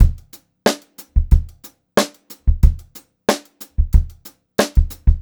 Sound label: Funk-Groove Drumloops